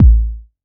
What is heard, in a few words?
subby bass drum one shot a key, bass drum single hit tuned to the A note; kick drum samples